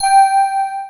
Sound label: match; Blingo 1.2